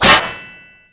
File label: metal; Mafie 1